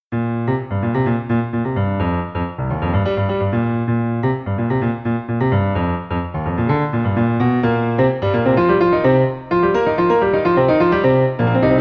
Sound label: Quartet FM funk